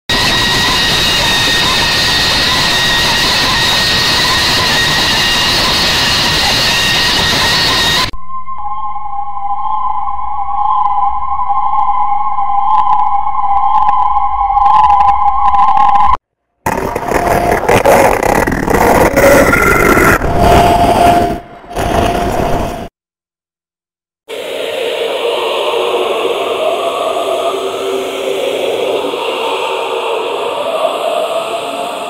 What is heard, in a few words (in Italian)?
suoni horror suoni paurosi per halloween